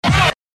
scratch

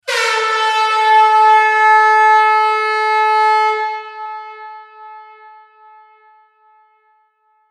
air horn hall

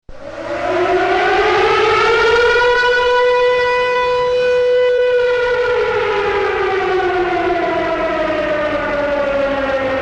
siren long

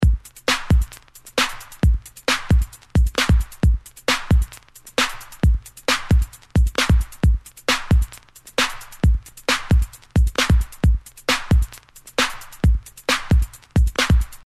Breaks and Beats loop

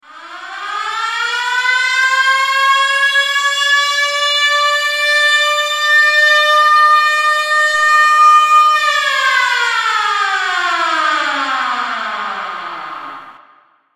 Siren Sound

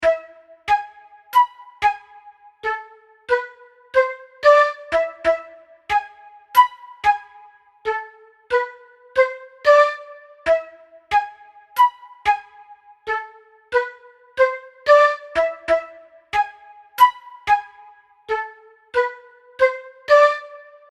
Flute loop 92bpm

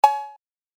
808 cowbell

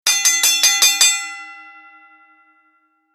ending gong

